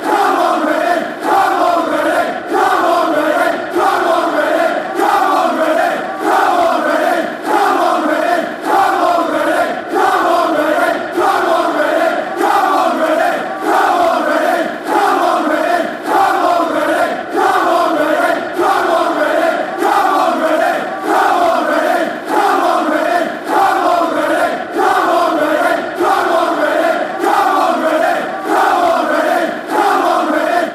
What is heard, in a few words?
come, chants